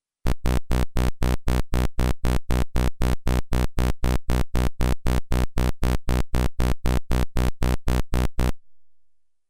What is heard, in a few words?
Step SAW